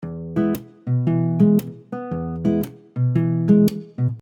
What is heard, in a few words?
Percussive Emaj 115bpm, Soft Nylon Guitar; Percussive Patterns; MG Soft Nylon Guitar - MIDI Pack